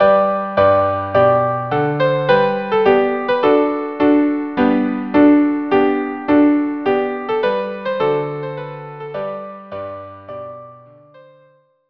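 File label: trumpet tune viola piano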